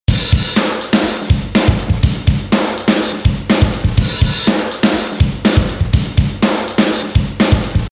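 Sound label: 123 extra roomy pop punk loop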